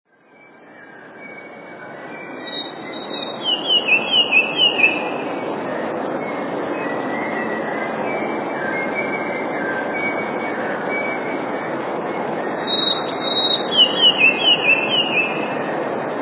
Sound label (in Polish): spiew; dwukrotnym zwolnieniu i obniżeniu o oktawę